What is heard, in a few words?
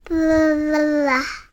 human toddler 18 months boy flick lips with finger with vocal